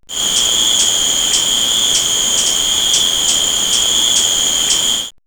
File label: Nature, Birds Insect; X-Static Goldmine